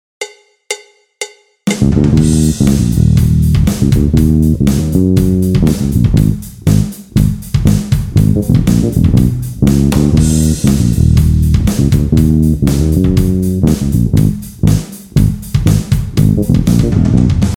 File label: Ahead of the beat with heavy drums